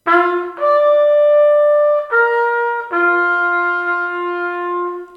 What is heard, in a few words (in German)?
hegeruf, jagd